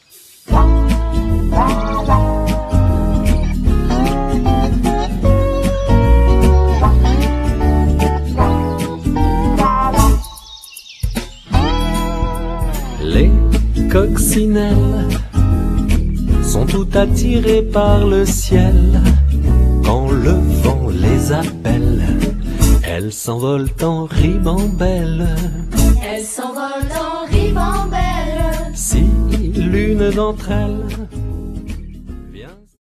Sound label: french